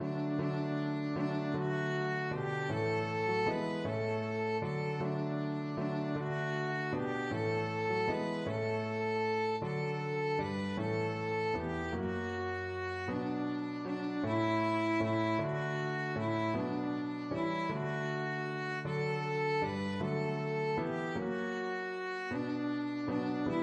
violin

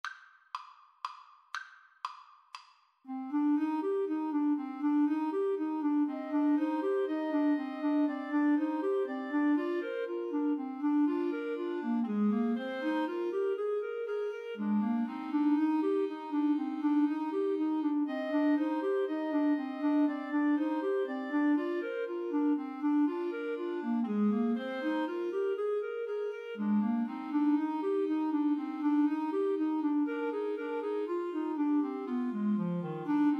Clarinet trio

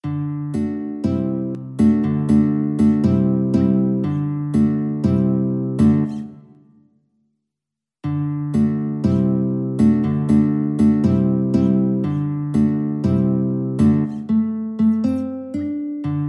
samba, guitar

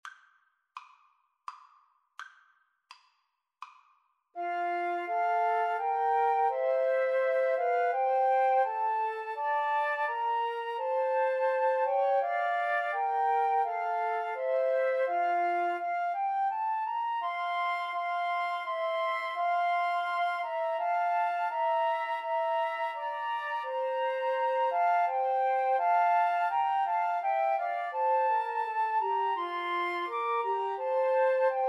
my country tis of thee, Flute Trio

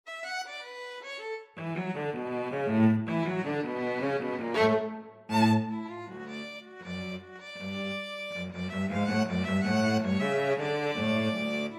violin cello duet

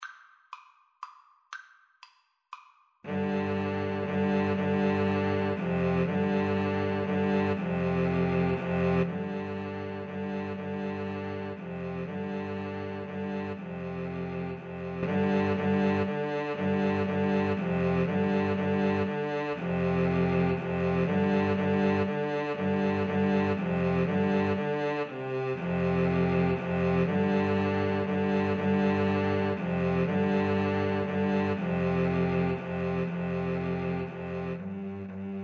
blast of wind, Viola Cello Duet

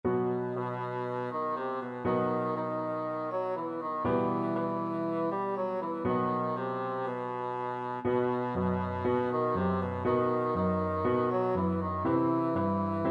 hava nagila, bassoon

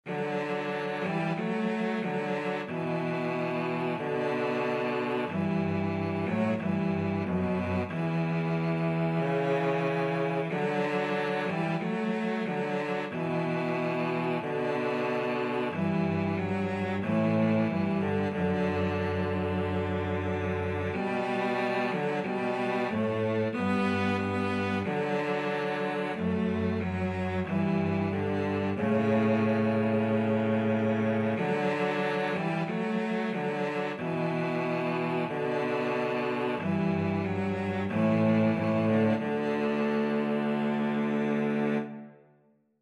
VLC duet, cello duet; bleak midwinter

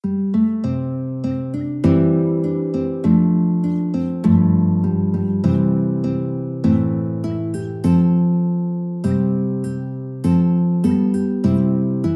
loch lomond, guitar

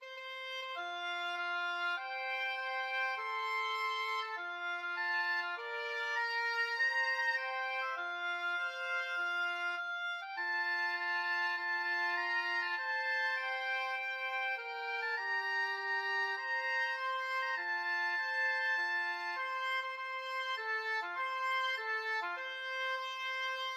la marseilleise, oboe duet

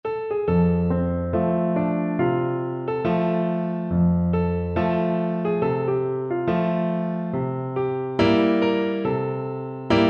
Bassoon

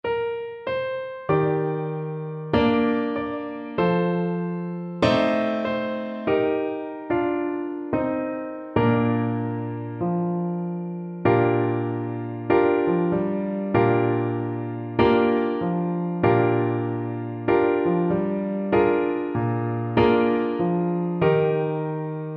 this little light, Tuba

